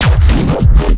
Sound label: Bass Shot; neuro; BIG5AUDIO